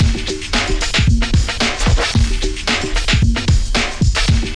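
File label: drum loop; X-Static Goldmine 3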